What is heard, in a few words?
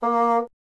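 wind bassoon A#; Miroslav Vitous - Solo Instruments VOL-1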